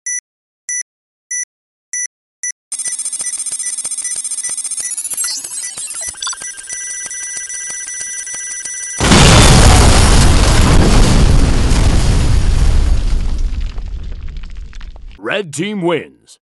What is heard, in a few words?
Countdown Bomb